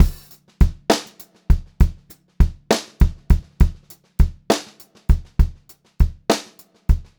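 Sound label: variation, pop-rock drumloops; USB Soundscan vol.37; AKAI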